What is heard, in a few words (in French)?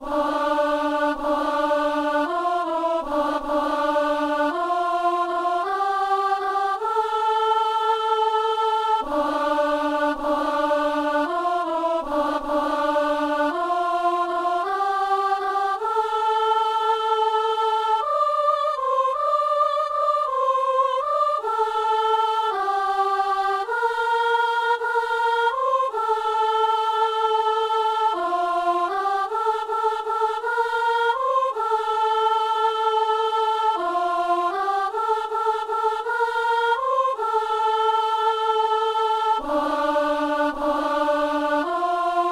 messe simple sanctus soprano; Messe du 17 janvier à Saint-Denis